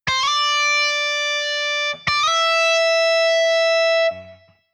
Midi Bend

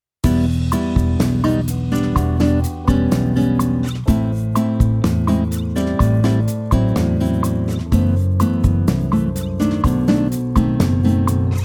guitar

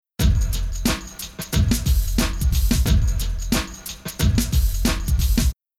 Rhythm HipHop

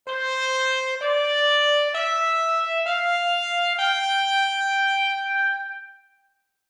tenuto

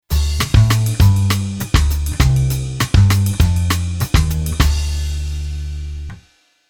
Bass Arr Latin

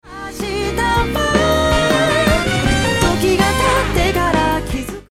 Horn

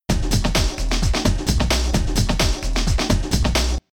Rhythm Loop

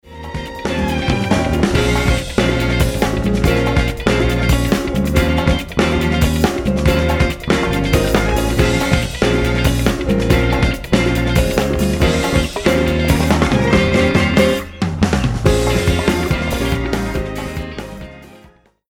Strings Kizami All

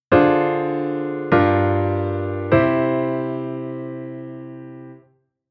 Chord Modal

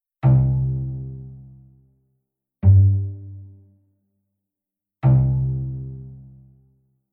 pizz

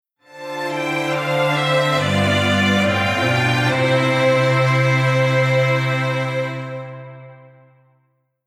Strings

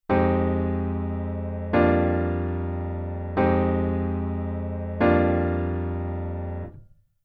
Jazz Waltz Chord Verse

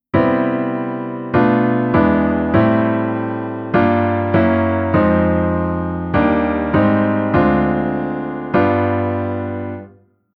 Chord Reharmo

